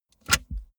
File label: car bmw e46 interior drink holder take bottle